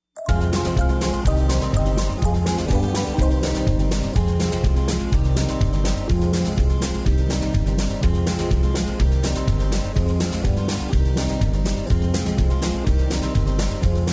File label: rhythm track, square dance music